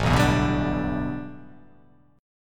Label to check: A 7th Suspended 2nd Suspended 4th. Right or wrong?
Right